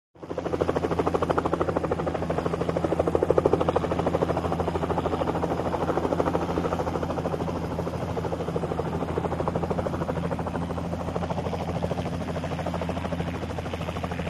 Helicopter Hover, Jet Ranger, with Blade Thwops, Exterior Perspective